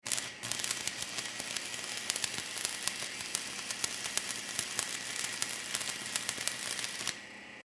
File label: Industry Welder Run Sparks; Welders; Machines